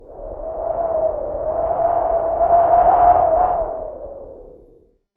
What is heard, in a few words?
Wind Howling